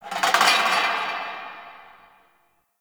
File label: Chain Rattle Distant, Crypt Ambience; One Offs; Champion Crypt